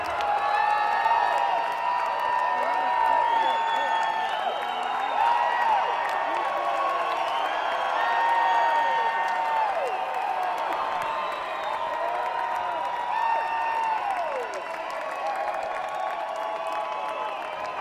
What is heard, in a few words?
Crowd; Thackerville, OK